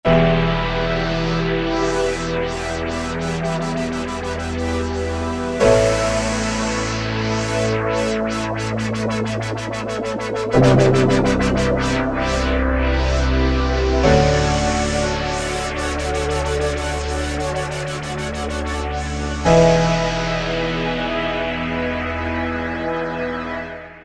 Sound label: key Wash Out